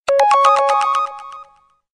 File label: Sms, SMS Tone